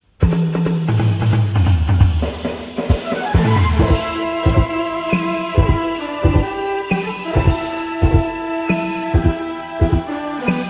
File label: karaoke